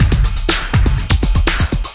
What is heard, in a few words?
thick electro, drum loops and breaks